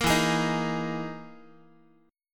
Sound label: E Major 7th Flat 5th down Guitar; EM7b5 strummed